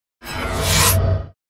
perehod kiberpank

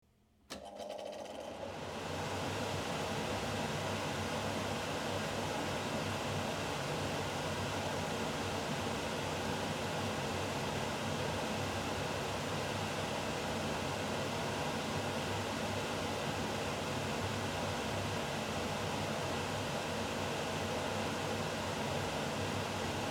vyt; neff

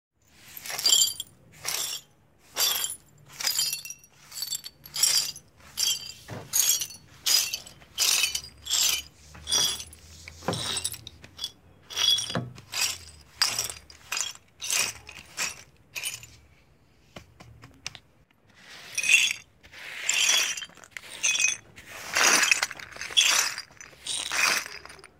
kuhnia

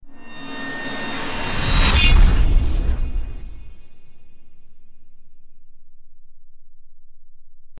zvuki dlya treilera

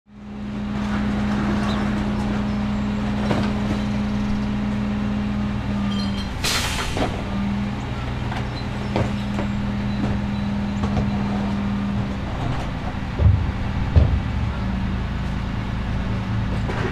musorovoz

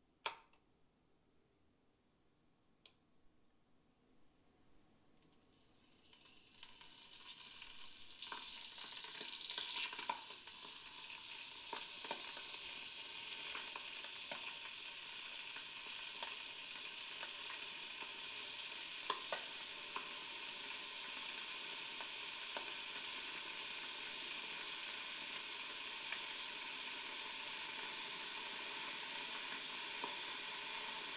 vkl i zakip elchainik

